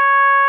C#6, TRUMPET; Best Service ProSamples vol.42 - Session Instruments; PS-42 Session Instruments

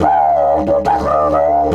140, DIDGE RIFFS

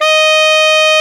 SAX D#4; Best Service Alpha Dance vol.2

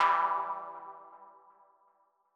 M Mono DBeat E3 V127; KG MegaSaur

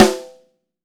sonor snare

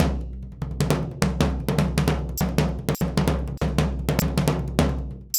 SURDO, Modern Percussion Loops; Soundscan vol.56; AKAI; SURDO 100

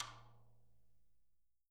STK RIM, Living Drums

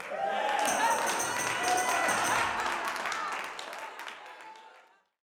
OUTBURST, GO 2CHURCH, Gospel

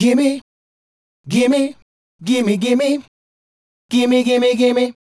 Male Group, Hip-Hop and RnB Vocals

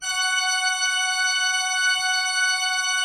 F5, cathedral organ; Propeller Island

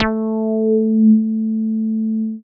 TB 303 BASS

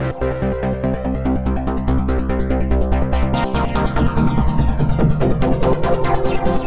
NRG Arp, trance

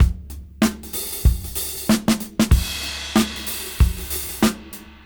Funk 94 BPM; AMG - Keith LeBlanc Phat Butt Jams

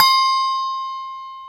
BELL C, TOY HANDBELL; AKAI S-Series CD-ROM Sound Library VOL-7

